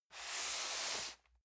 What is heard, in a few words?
cardboard box medium slide soft; objekte